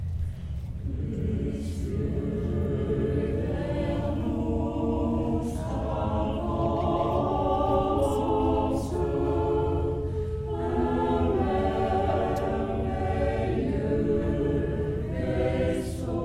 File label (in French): Chant de l'Avent